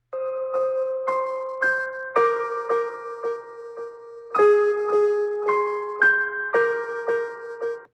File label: sad horror podcast music